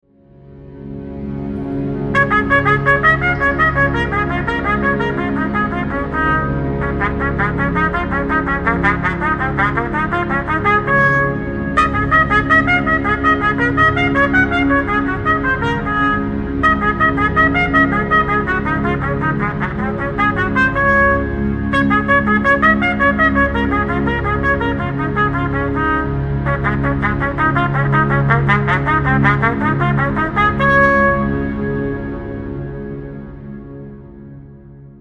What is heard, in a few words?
Drone Articulation Study